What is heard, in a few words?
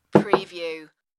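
Glass Put Table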